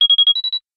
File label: call hi, business rings